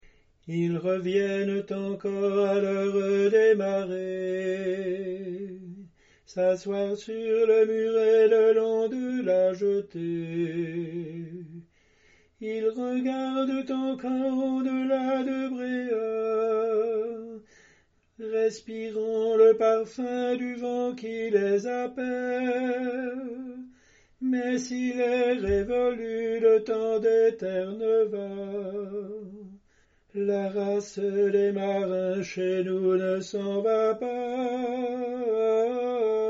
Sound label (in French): soprano; loguivy de la mer